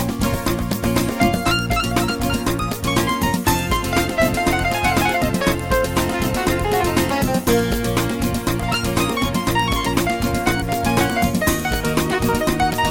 Spanish Dance

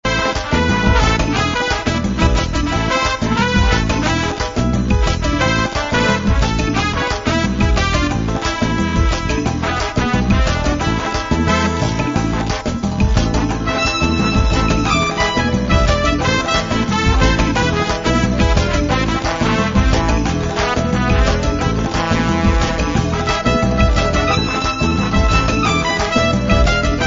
Cuban Salsa

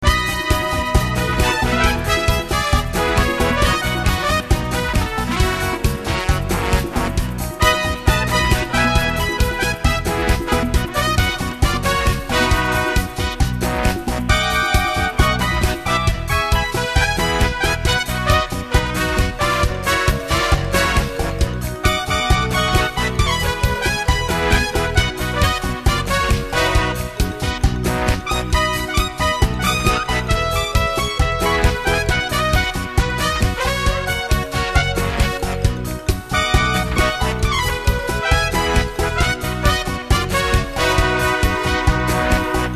Cha Cha

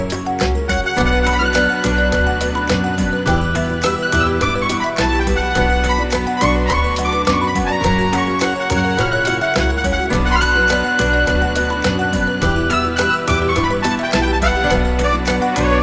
Latin Bolero

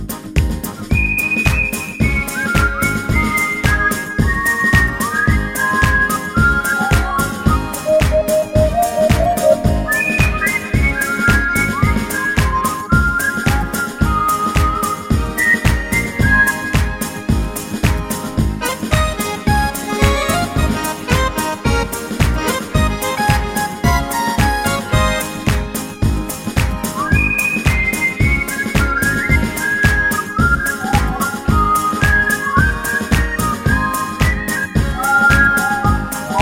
Scottish Reel

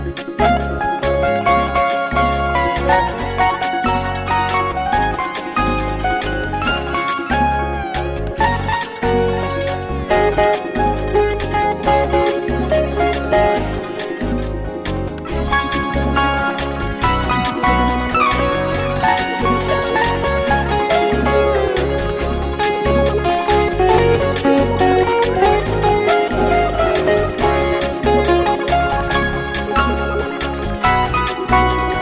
Pop Rock

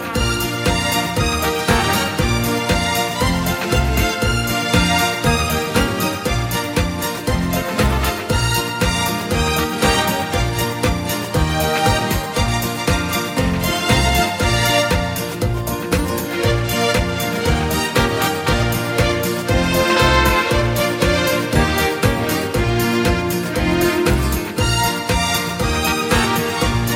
Mariachi Polka

